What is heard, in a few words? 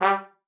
G3, TRUMPET; Best Service ProSamples vol.42 - Session Instruments; PS-42 Session Instruments